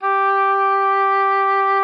OBOE G4; Xsample VOL-6

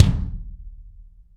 BD AMBIENT, Drums; Real Mega Drums

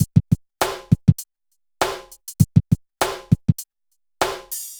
BPM100 SYN

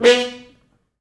Horns stops

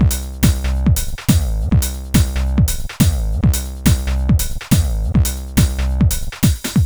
140 C trance kit; Trance Explosion Vol1

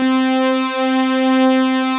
SYNTHS; Pro-Rec - Synthsonic

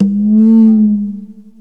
bong bend, Jazz Drum Kit; Giga Samples Collection

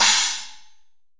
MUTE, CYMBAL

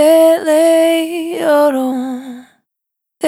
Vapor Dry, Single Voice Melodic Loops

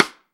STICK, Drum 1 Dry; East Collexion

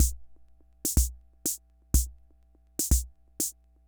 Ace Tone Rhythm Fever Loop; Drum Machines; 300 Drum Machines

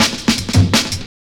Ruffige Cut, Jungle, Drum Loops 165.5; Zero-G Jungle Warfare 1, 2 & 3

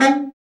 BRA BARI, Bariton Sax

